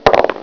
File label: Short Dice Sound